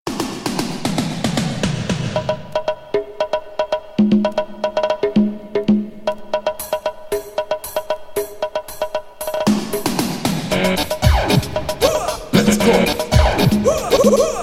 italo disco, ringtones electronic

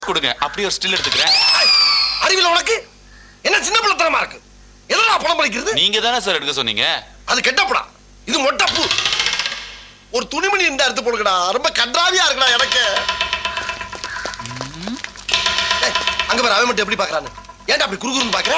marimba

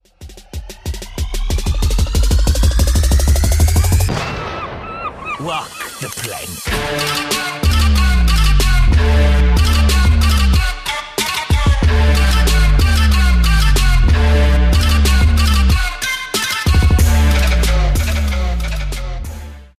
Caribbean TRAP

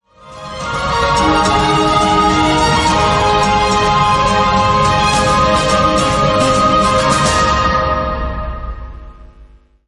Modern Sms Tone

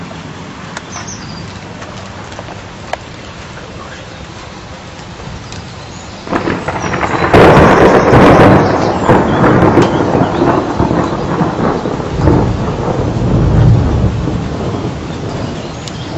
bird tune n thunder; animals

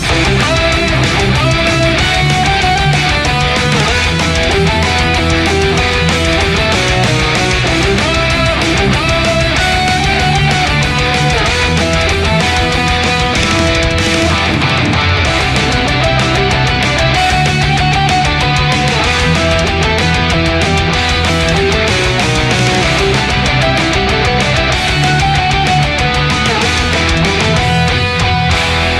Metal

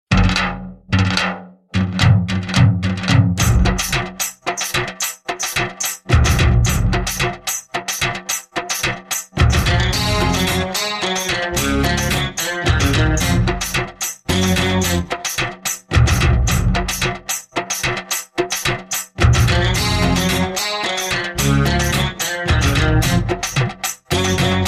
music

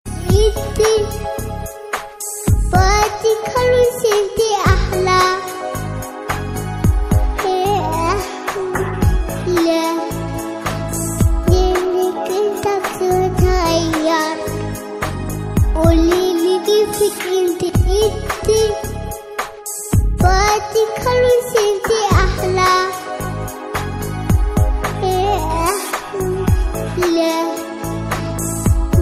cool baby, ringtones children

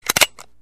gun short

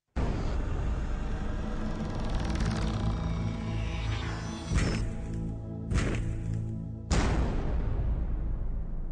3D Sound

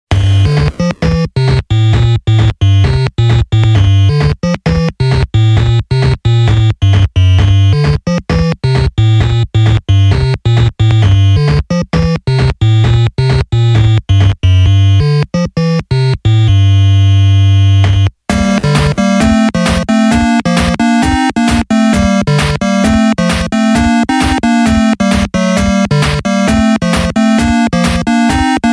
8bit; Masculine Devil

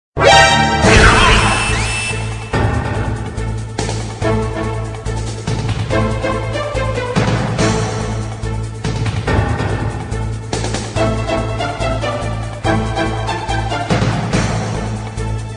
music; metal gear

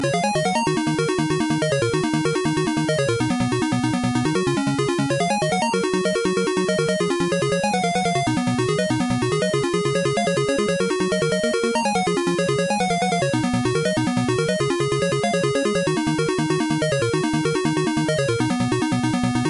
tones

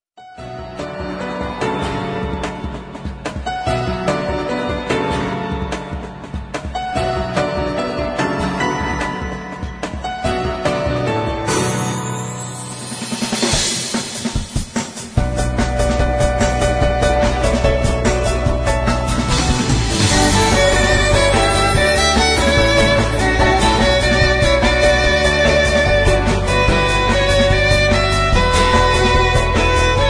violin edition

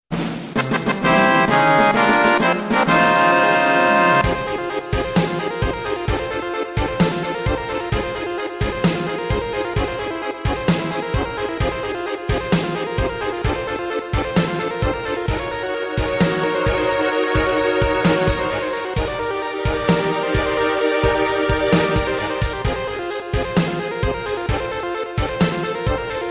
victory fanafare; games; ringtones

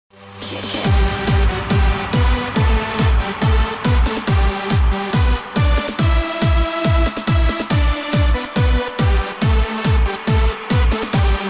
techno new year song

